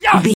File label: voice male attack